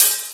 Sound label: treble heavy open high hat sample f sharp key; Duration 0:01; Samplerate 44100 Hz; Channels Mono